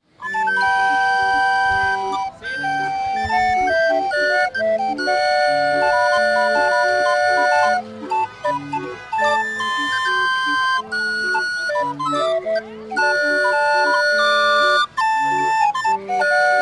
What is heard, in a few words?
mexico city organ grinder